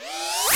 sfx sci fi; Masterbits - Switch FX

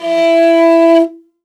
L, morin khuur, World Instruments

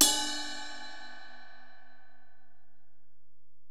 Ride, Alesis DM-PRO, Drum Machines

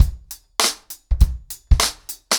100BPM, ACOUSTIC DRUMS; The Roots Drumloops

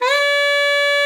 SLID D5, ALTOSAX; Xsample VOL-2

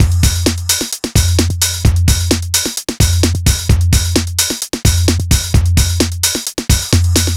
NRG Break, Trance, Drums, Breaks

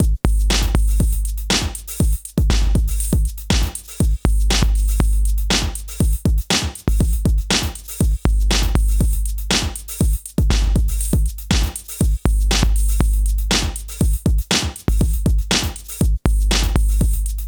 TECHNO; INIS; Funky Xtreams

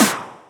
Snares; Vengeance - Essential Clubsounds Vol.4

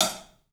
club hat; 3D Sound; 3D Drums

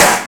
Hip Hop Snare; Snares

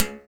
STR BS, Berimbau; Roland LCDP11 Africa VOL-1

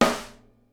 SNARE, Live Snares

